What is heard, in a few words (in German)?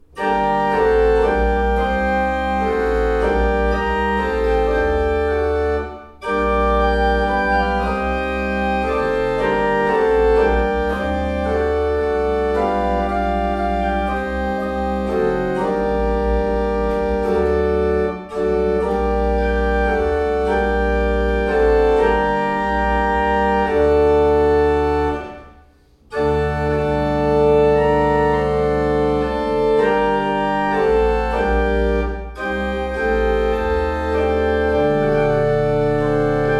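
Orgel, pfeifenorgeln; Kreienbrink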